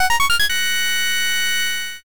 fullcombo

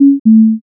error

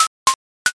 crack

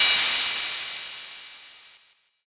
cymbal

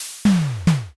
press

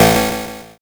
zap

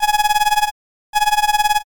black phone

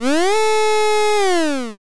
elephant

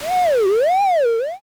door creak open

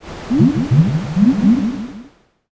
poison

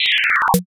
window close

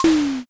door open

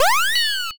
transform

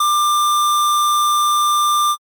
ecg flat

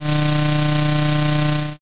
shredder

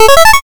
power up

